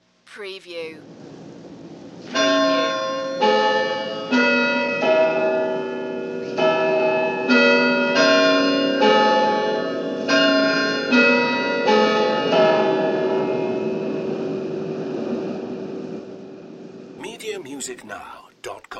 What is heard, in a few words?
City Tower Bell Chimes Hour